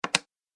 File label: Quick Placement Of Coin On Table; Money Sound Effects